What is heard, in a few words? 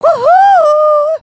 double wave, emotes